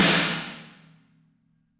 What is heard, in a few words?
Snare Hard; apulSoft apTrigga2; Steven Slate Drums